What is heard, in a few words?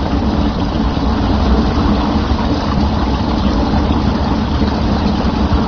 pipes